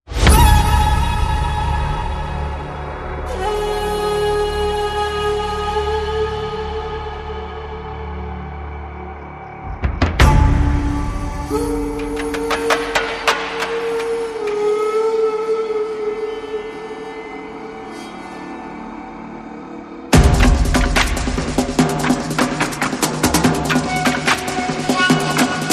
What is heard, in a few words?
No Vocals